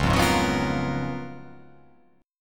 C sharp 13th C sharp

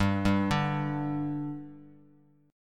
G 5th G Bass Standard, chord; G5 arpeggiated

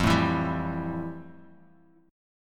F Major 9th F; FM9 strummed; JGuitar

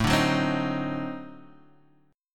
D 7th Flat 9th A, chord

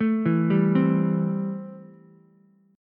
C 6th C down Ukulele; C6 arpeggiated

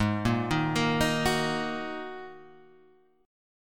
G sharp 7th Suspended 2nd, Guitar, Half Step Down; chord

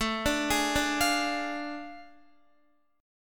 A Major 7th Suspended 4th Sharp 5th, Guitar; AM7sus4#5; arpeggiated; JGuitar

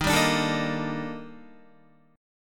D sharp Major Sharp 11th, Guitar; D#M#11; strummed

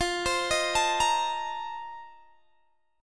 F 11th F, down, Guitar Open G

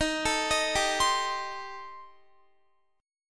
Eb 6th Flat 5th Eb down Guitar; Eb6b5 arpeggiated; JGuitar

